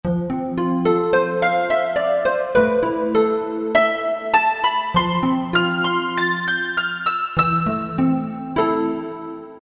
Metal Harp, Roland D-50; Factory preset demos

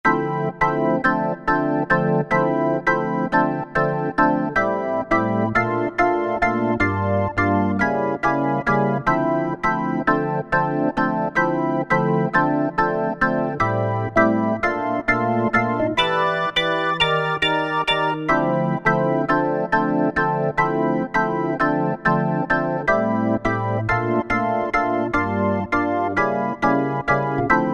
Classic M1 Organ, Korg TR-Rack

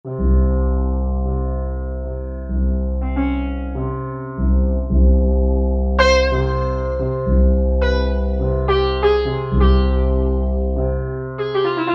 2 Sitars, Korg MSC-10S Ethnic

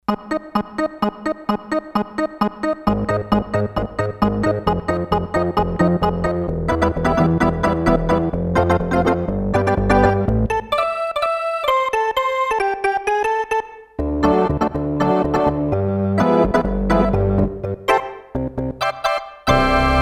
Moss Organ, Roland D-10; Factory Patches

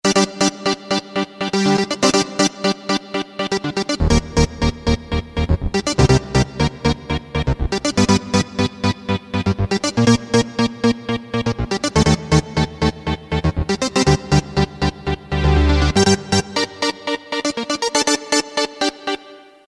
Synth Echo, Yamaha YPT-400; Voices

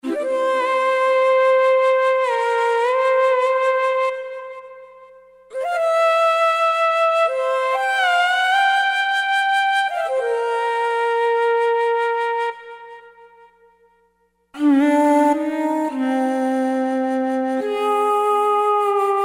Real Shaku, Korg N364; Factory Prog demos

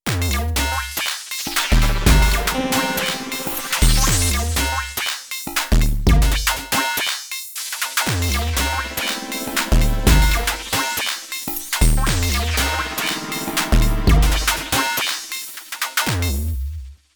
Metalic Kit, Korg Radias-R

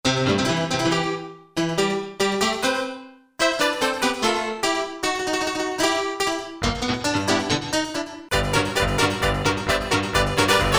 SALOON PNO, Korg MSC-6S Fretted Insts; Fretted Insts 1

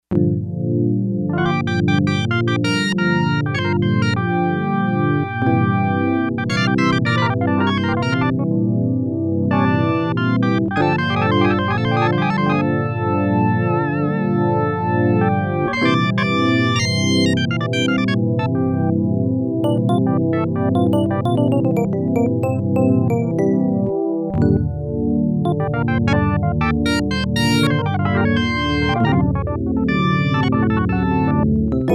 Split Electric Organ, Yamaha TX802; Performances; Factory Patches

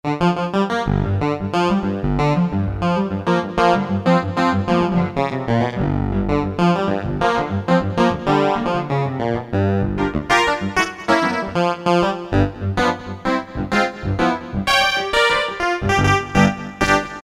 DDL Clav, Korg DSS-1 DWGS synthesizer; Korg DSS-1 Sound Library

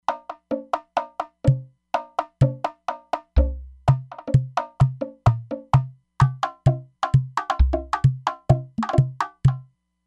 Stereo Bata, E-mu Proteus-3; Factory patches

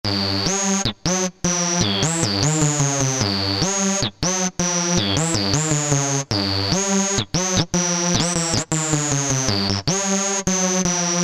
Technozid, Alesis Z2 EuroDance

